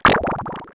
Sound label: fighting game designed collect consume item pick up liquid; wet drink